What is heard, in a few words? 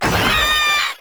Maggot Attack; Enemies; Vocals; Warframe